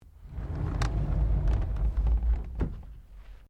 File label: Drawer Interior Wooden Bathroom Large Full Close